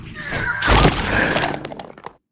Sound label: ant death, monster